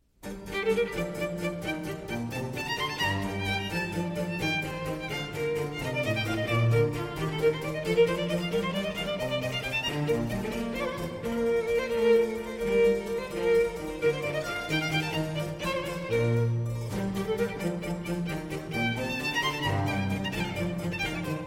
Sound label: Violin Sonata in G Minor, Allegro; Vivaldi